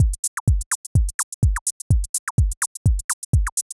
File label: minimal house percussion loop 126bpm; 2 bars